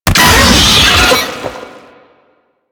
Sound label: Brachiolyst Pain, Vocals; Enemies; Warframe; Proto Infested